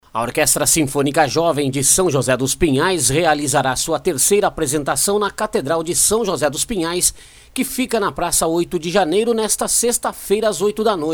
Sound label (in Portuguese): Orquestra sinfonica